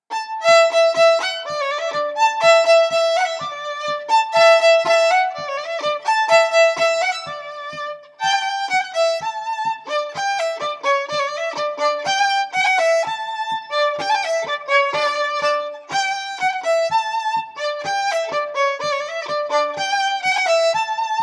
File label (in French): Bourree